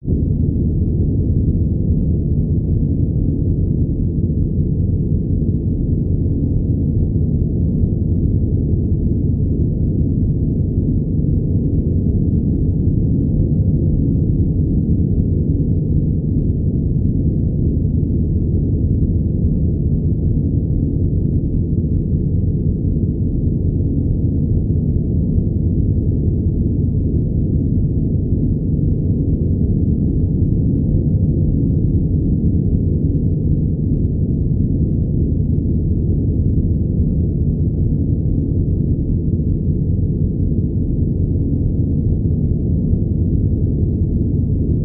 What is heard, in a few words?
Ambiance Bass Tonal; drones & tones